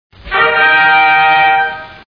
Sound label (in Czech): trubka